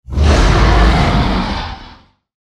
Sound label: dragon roar huge